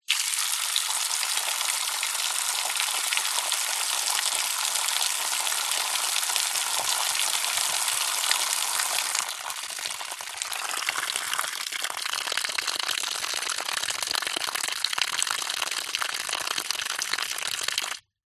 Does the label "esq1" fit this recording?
No